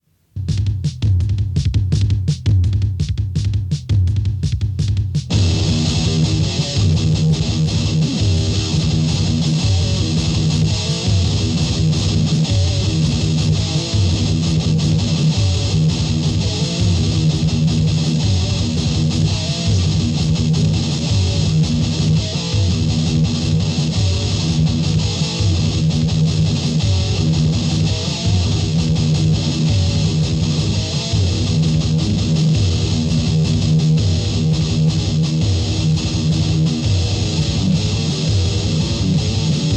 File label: Riff Tapes